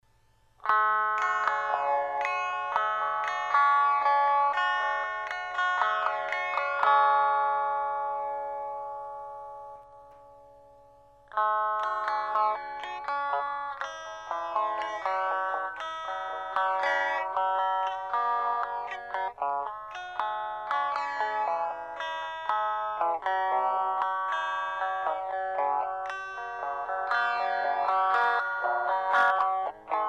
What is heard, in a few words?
mono stereo